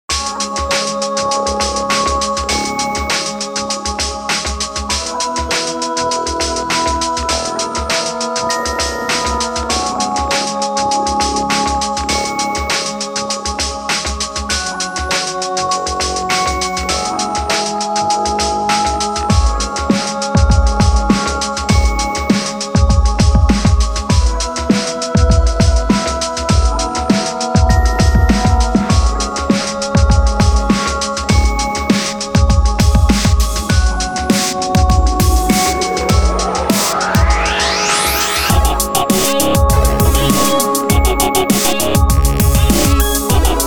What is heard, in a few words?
Glitchhop Intro